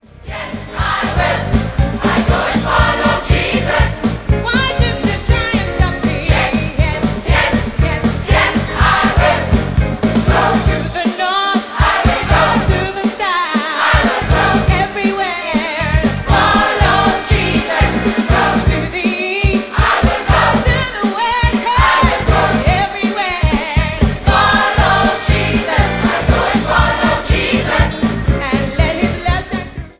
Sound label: Choir